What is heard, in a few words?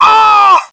death; svencoop; scshogo; fallentrooper